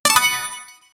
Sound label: a money addition sound effect; finance